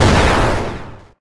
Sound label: bombsplosion; Undertale